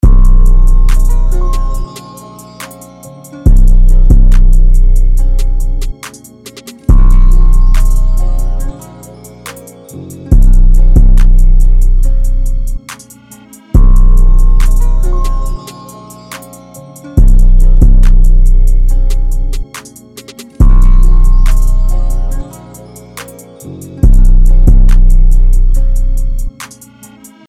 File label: 140BPM, beats instrumentals